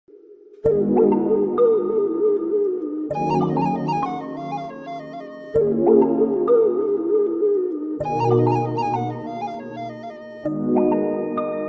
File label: sad bgm; premalu